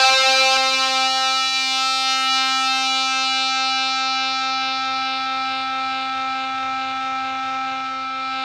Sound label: ZWEEP; Spectrasonic Distorted Reality 2